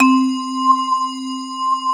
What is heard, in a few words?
keys, Drum & Bass, 160 BPM; Ueberschall; Drum & Bass Flash Trackz